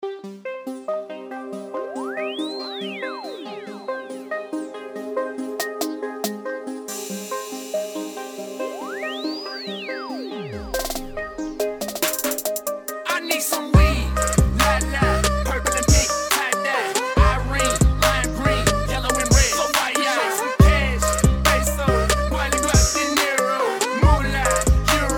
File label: hiphop mix